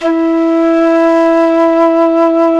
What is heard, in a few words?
Jazz Flute